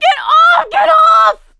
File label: scream while pounced, survivor voice; producer